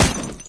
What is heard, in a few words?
player ladder; Source Engine; dod